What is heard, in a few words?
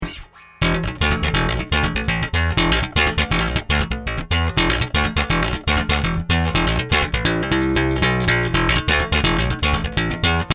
Microbass, effector bass